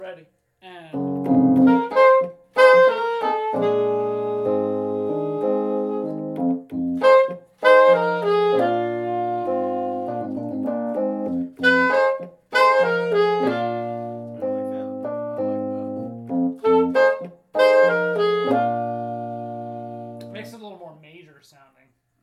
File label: Hornline; Rooster